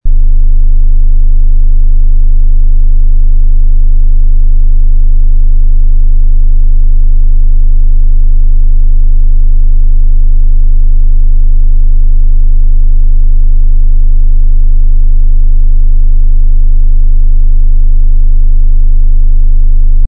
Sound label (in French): 25 Hz, Fréquence Fix de 25Hz; 25Hrz 20s